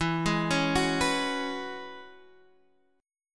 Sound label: E 6th Add 9th E down Guitar Drop D